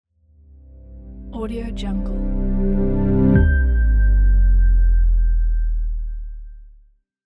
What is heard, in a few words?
soft calm logo reveal